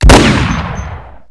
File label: deagle, weapons